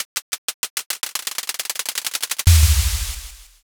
drumroll

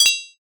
sword clash

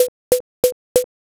footstep wood

